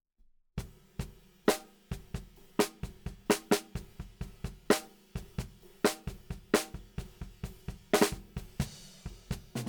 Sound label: Snare Bot